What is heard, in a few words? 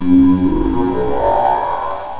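alien car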